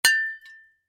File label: Bottle Full Tap, Bottle Full Tap Wine Beer Bottle; Tapping; Foley; Sound Effects:Objects; SoundEffects-Blastwave